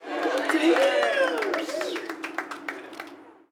street ball crowd cheer low; Crowds

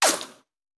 Bullet Ricochet; Sound Ideas; Guns

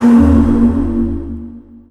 Pickup Magic; SFX Kit; Pickups

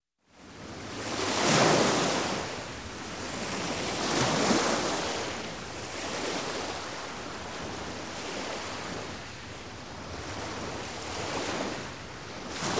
Water Ocean Medium; Sound Ideas 2000 Series

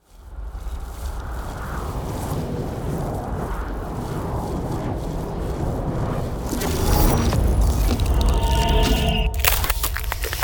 Ice Spell Ultimate Icy Vortex Obliteration; Ultimate Ice Spells; Arctic Aura

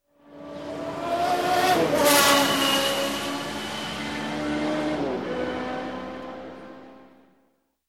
Indy Style Race; Sound Ideas; Series 2000

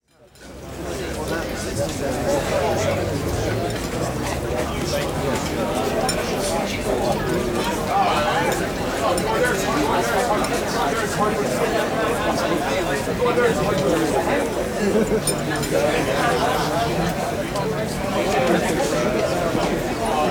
Restaurant Outdoor Patio, Walla; Sound Ideas; Walla Series

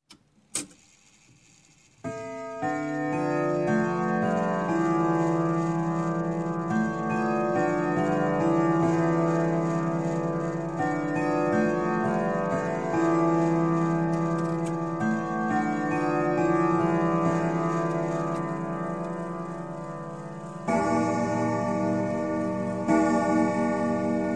Clock, Grandfather Winchester Chime - Strike Fo; Strike Four O'clock; Sound Ideas 2000 Series

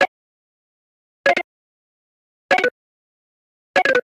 Arcade Click Negative; boom library; The Interface

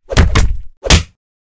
Punch Combo 3X; Hollywood Edge; Martial Arts and Human Impacts

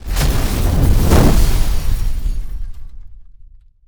Fire Ignite Large; Destruction

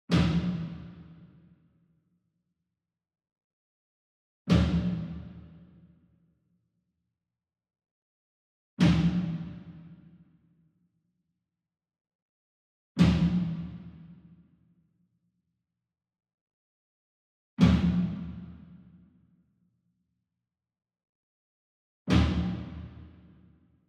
SINGLE DRUM Tom Concert Flam Soft Beater Far; boom library; Cinematic Strikes Construction Kit

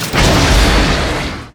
Rocket Launcher Shot; SFX Kit; Weapons

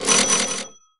Pickup Coins; Pickups

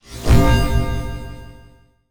Menu Fail Error

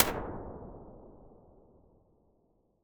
MEDIUM DAMPING CAVE, impulse responses

